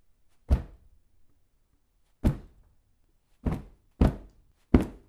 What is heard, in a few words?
body hits